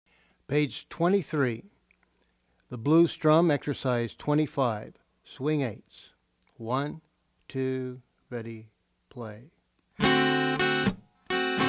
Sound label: Blues Strum